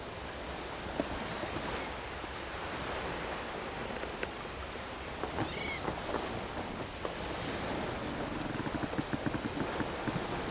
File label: sailing loop